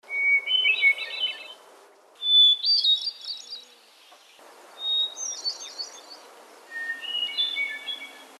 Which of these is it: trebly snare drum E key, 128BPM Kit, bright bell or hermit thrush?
hermit thrush